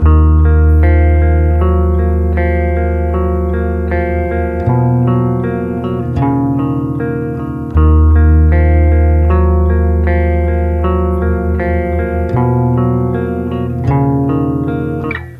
Guitar Riff

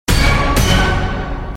boo

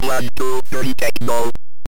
techno, ringtones

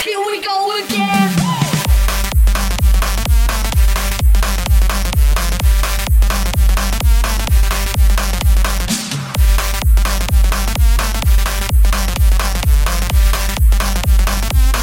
MELBOURNE BOUNCE

